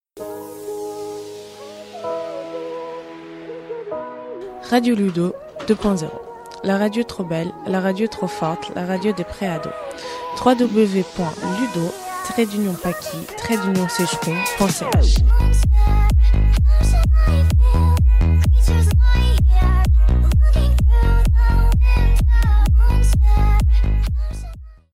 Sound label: Jingle